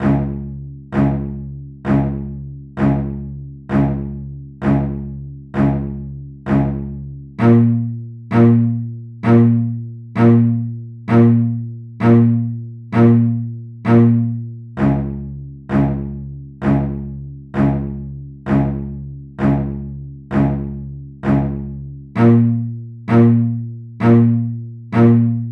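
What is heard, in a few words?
Deep Strings, 065, C minor, Dry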